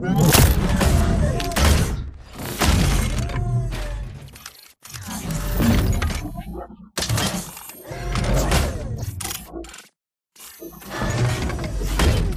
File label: fastball throw end; Titanfall 2